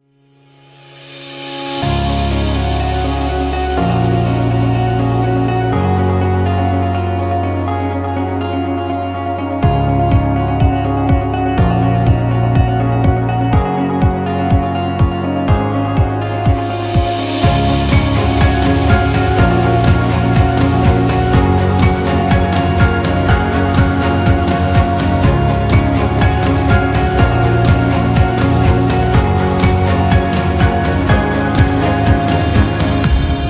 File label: Motivation; corporate background